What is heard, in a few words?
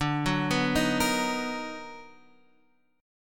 B Major 7th D; Bmaj7/D arpeggiated; JGuitar